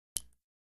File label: Dart Movement Hand, darts